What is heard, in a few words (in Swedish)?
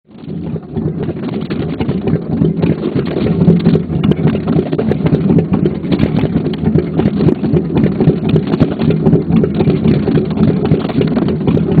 tomgang